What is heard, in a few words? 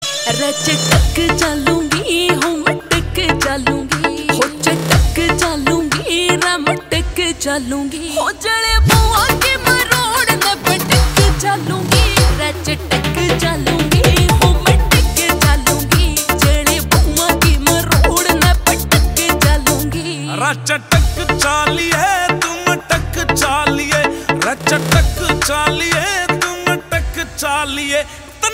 Ringtone